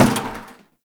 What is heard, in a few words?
Destructible Bucket Impact; Ambience; Gameplay; Warframe; Nineteen Ninety Nine